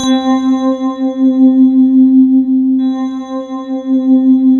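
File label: Rhodes, synth line; 90 s Sample CDs; NiceBeats; SynthLine VOL-2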